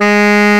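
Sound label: saxophone G#2; AKAI S-Series CD-ROM Sound Library VOL-8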